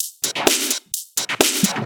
House loops 128BPM; Vengeance Samples

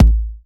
Bassdrum; Vengeance Samples

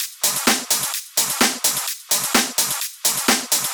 Minimal Loops

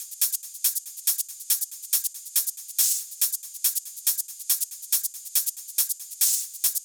140BPM Genetic Kit

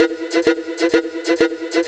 House Loops, Vocal Loops House 128BPM

